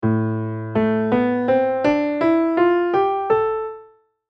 AEOLIAN

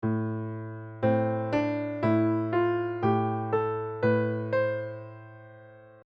AEOLIAN; Modal Harmony: An In-Depth How-To Guide

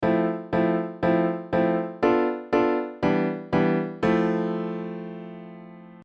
Lydian prog; Modal Harmony: An In-Depth How-To Guide

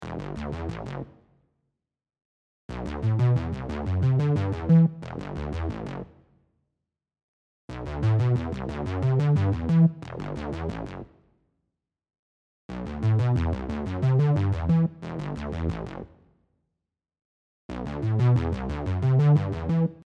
Intro Synth Stem

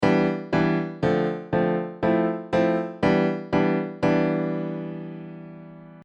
Phrygian prog; Modal Harmony: An In-Depth How-To Guide

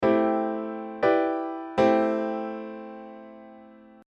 Aeolian i v; Modal Harmony: An In-Depth How-To Guide

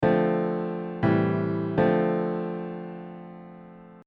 Dmin7 Amin7; Modal Harmony: An In-Depth How-To Guide

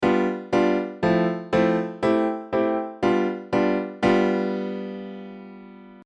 Mixolydian prog; Modal Harmony: An In-Depth How-To Guide

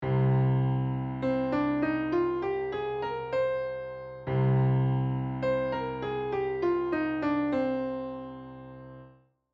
modes aeolian

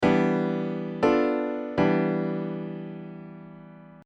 Phrygian i v; Modal Harmony: An In-Depth How-To Guide

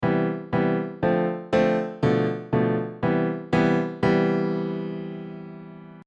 Ionian prog; Modal Harmony; Modal Harmony: An In-Depth How-To Guide

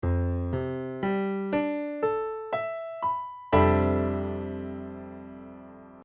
F Lydian; Modal Harmony: An In-Depth How-To Guide

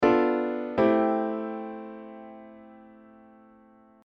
Locrian; Modal Harmony; Modal Harmony: An In-Depth How-To Guide

